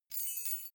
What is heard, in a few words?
key ring large fly tinkle; objekte; schluessel